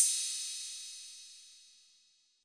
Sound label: Hat Crashes; drums; hip hop; STYLE KITS (Hip Hop, Etc); Khezie Hip Hop Kit